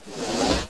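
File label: item back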